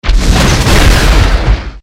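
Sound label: Dragon buff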